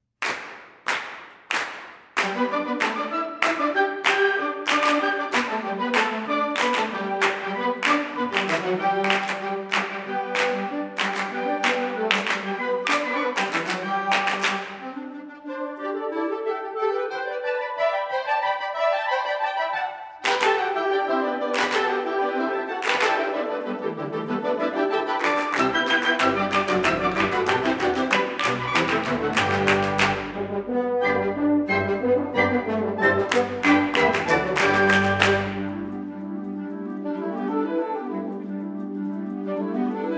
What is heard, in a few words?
bands recordings